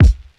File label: Boom-Bap Kick